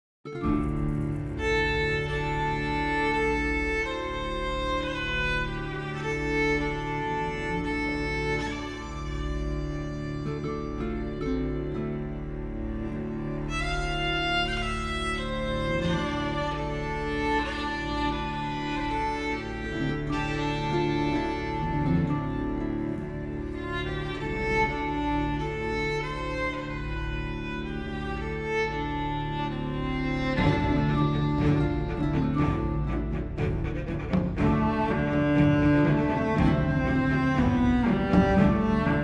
The Winding Path Of Adventure, Classical Cinematic, Grand